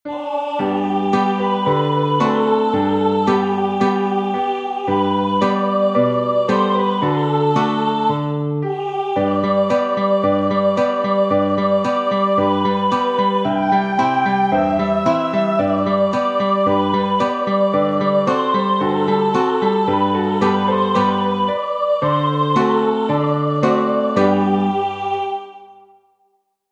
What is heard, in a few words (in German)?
Kuhreihen, alphorns